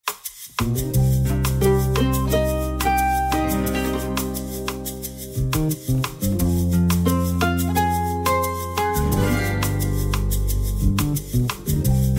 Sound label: BGM; Hand Game